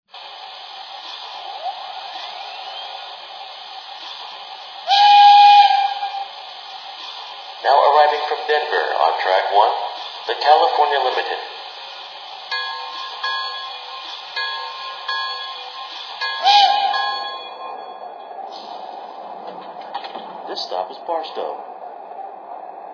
ten wheel; SF

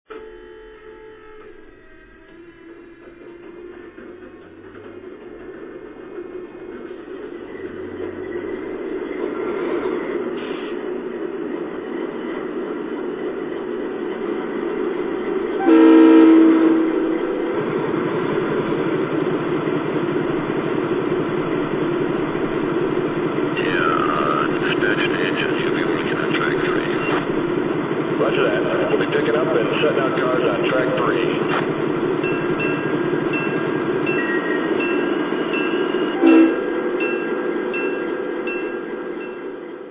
GE DASH8

